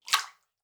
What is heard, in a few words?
Liquid Water Movement Splash Bathtub